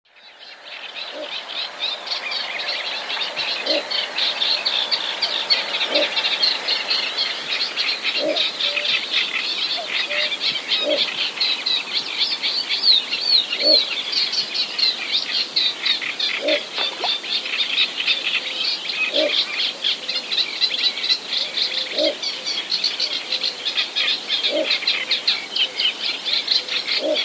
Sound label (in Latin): ixobrychus minutus